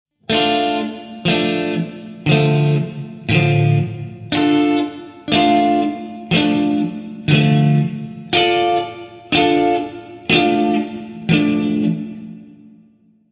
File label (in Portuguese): Montagem de Acordes Triade Menor